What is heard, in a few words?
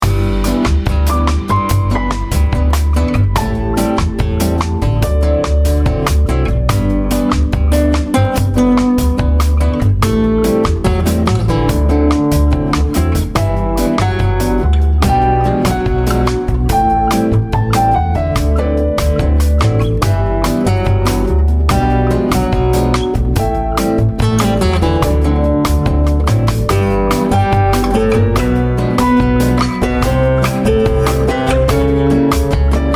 Simply Bossa Nova; The Sims Mobile